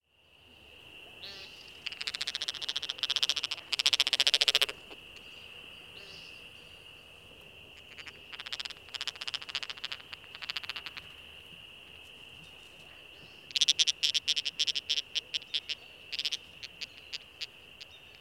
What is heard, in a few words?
american woodcock aggressive cackles